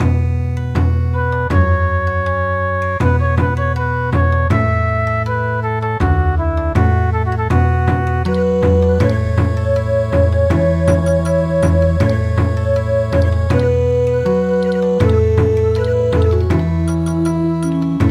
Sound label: Bon Odori; Autumn Mountain Song